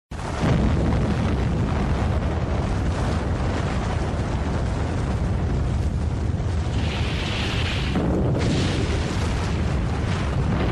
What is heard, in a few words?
Bomb Explosion Sound